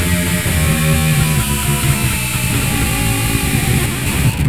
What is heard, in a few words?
echo